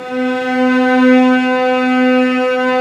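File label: cellos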